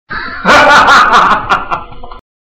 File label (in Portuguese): risada